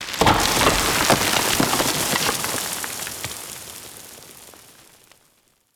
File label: Orb Entrance Debris; Venus Open World; Objective Rooms; Deck Twelve